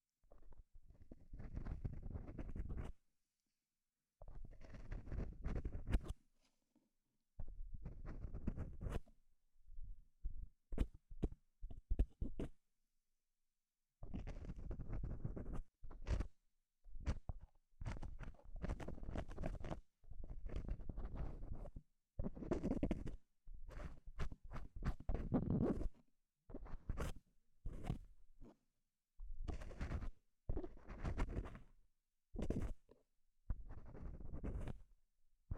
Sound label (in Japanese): 耳かき 右, ASMR